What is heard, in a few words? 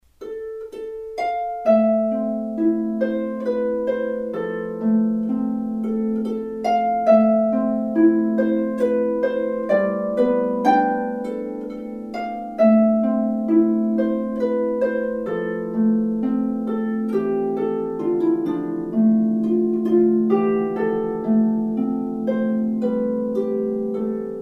Reminiscences for Harp